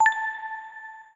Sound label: Blink